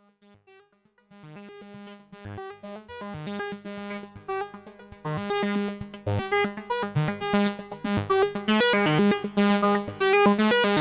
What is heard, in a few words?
4 voice sequ LPF